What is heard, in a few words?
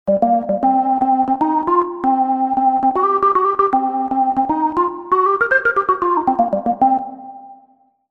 Jazz B3; TURBO VL